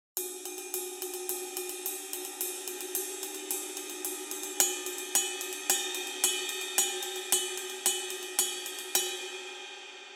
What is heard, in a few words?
Custom Ride 20 Medium